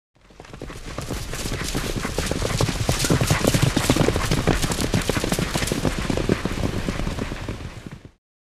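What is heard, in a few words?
By 3 Welsh Ponies Gallop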